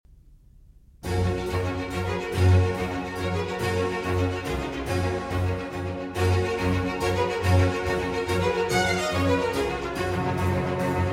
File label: Concerto for Strings and Continuo in G major